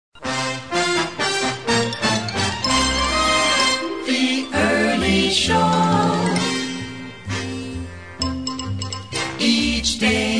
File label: jingle